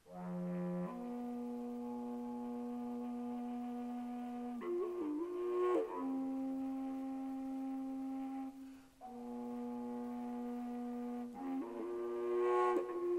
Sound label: f plain 3parts, fujara; folkart slovakia